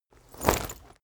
leather armor movement

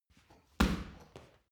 punching bag kick hard

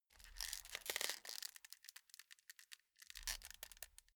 calamus creak slow

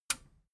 metal button radio push enable

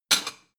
plate small shelve slide hard

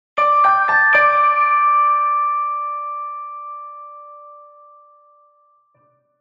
little bells

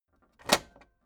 tape deck close heavy

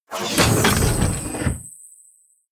sci-fi plasma cannon load

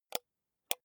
computer mouse wheel click slow

